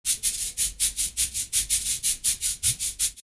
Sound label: Live Perc Riffs; Jungle Frenzy 1